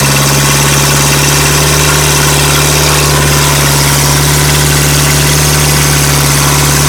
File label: i4 petrol large, engines; acf engines